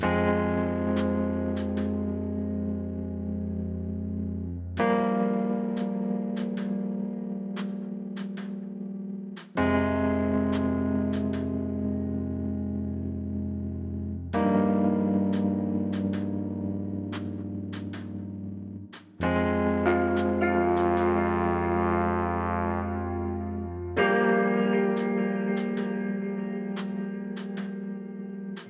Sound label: Loop 100bpm; Mozy; jenny leclue detectiv